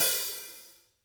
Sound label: VINT KITS; Sonic Reality - Vintage Timetraveler